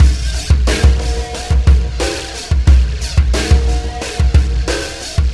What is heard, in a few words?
System Overload Beat, Processed Drumkits